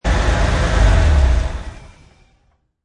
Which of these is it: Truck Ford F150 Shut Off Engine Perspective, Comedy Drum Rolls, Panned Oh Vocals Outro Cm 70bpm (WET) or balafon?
Truck Ford F150 Shut Off Engine Perspective